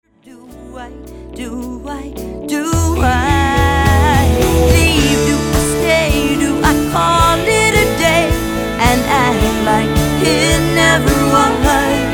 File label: Pop